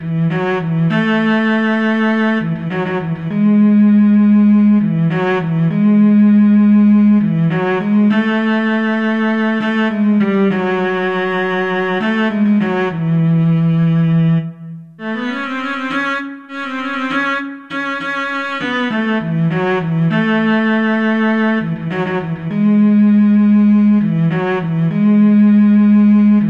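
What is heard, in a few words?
violoncello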